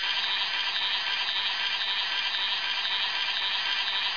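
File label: spider run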